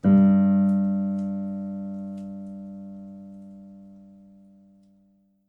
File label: piano sample